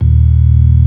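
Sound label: ORG. D#1; Best Service; Advanced Media Trax Modern Composer; B3 FULL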